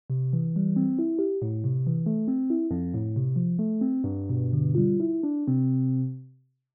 electra comparison original

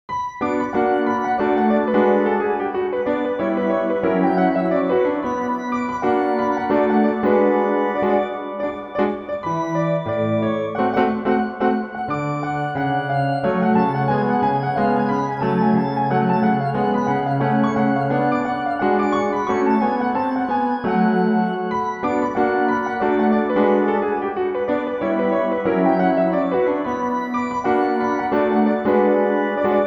Jazzy; Badinerie; Bach

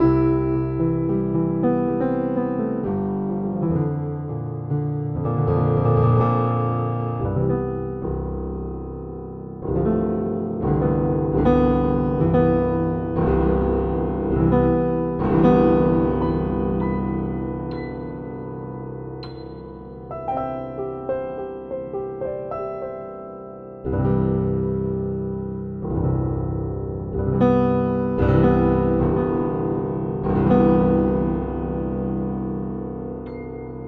Trident improv moment

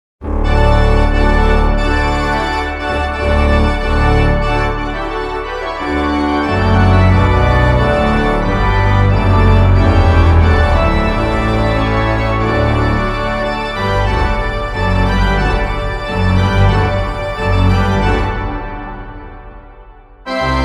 Most overwhelming Organteq sound

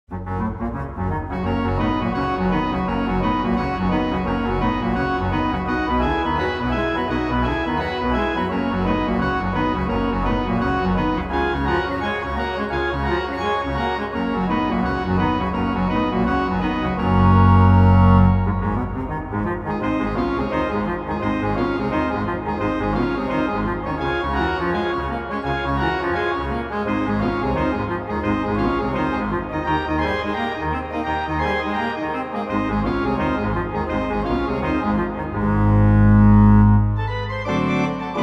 Organteq

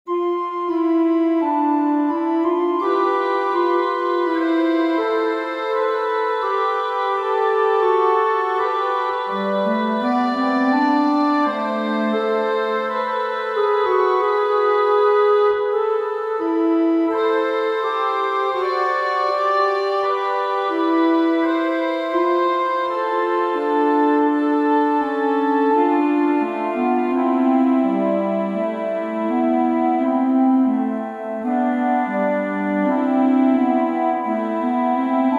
Organteq; Easter; Da Jesus an dem Kreuze stund; S.Scheidt

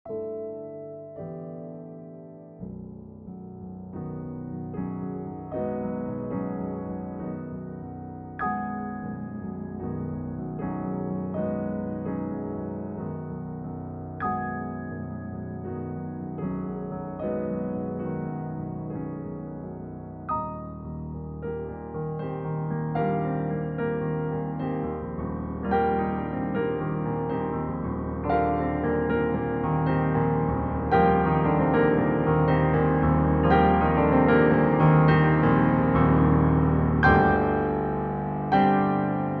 warm modern D

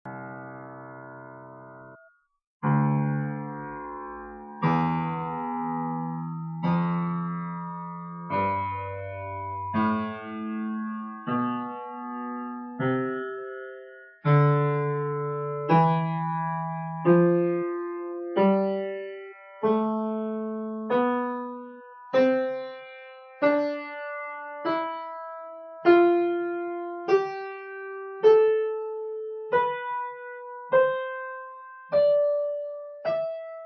piano strings

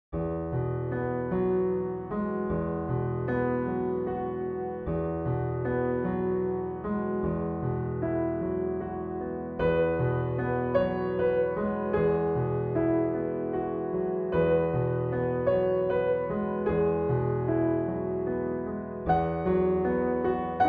Glassy ambience Silent Night

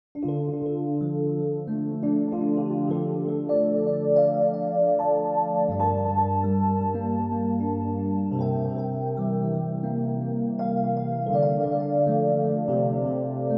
vintage sound, piano; Pianoteq

